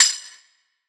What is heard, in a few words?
Tamb Processed; Drums; Tambourine